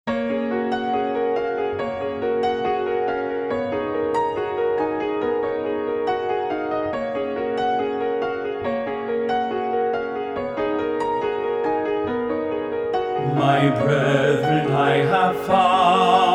SATB

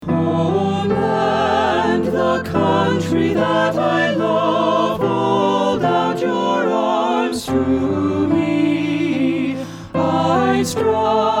SATB

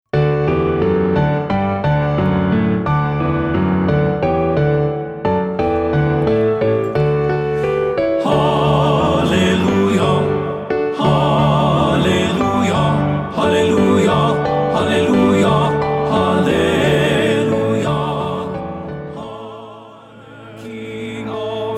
Hallelujah Chorus TTBB

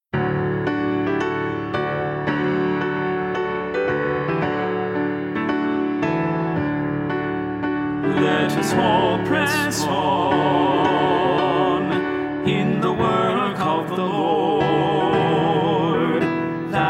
Alto

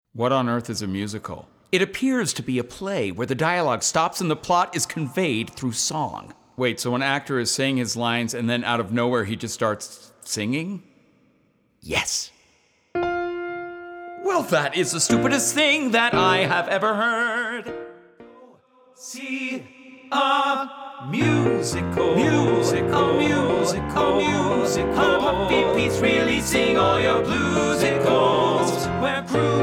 TTBB

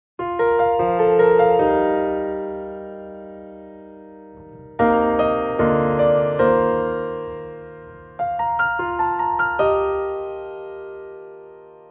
SATB

